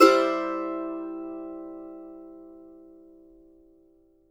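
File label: MANDO MAJ C major up, Right; Sampleheads; Whole Lotta Country 1 & 2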